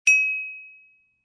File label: Wine Glass Hit Ding Single Hit, Household, Wine Glass Hit With Silverware, Ding, Ring, Toast, Single Hit; Dishware